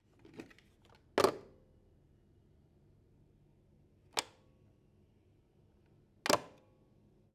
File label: Telephone, Pick Up, Hanging Up, Hotel, Vietnam